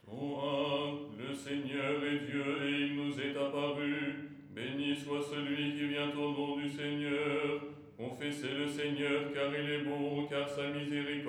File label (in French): soprano